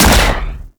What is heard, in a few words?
Arch Railgun 2D Shot; Warframe; Archwing